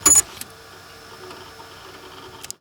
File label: computer; soundscrate